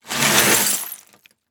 Crash Glass And Hollow Wood Debris Sliding On Concrete; crashes; AdobeCC